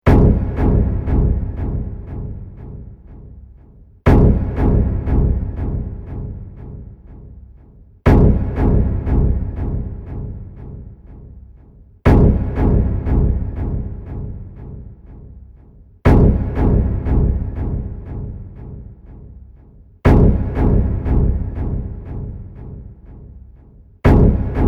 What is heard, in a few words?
Dramatic Strings stab, Repeating loop dramatic strings stab; Sound Design